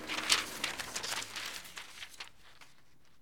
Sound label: Paper Rustle; SFX Kit; Foley - Misc